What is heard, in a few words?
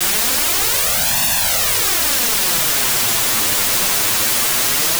flanger